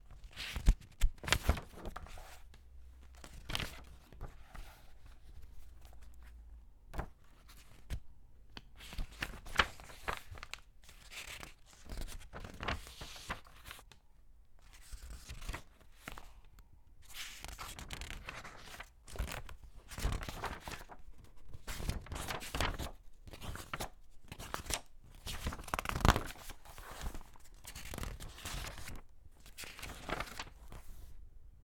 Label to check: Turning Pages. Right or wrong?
Right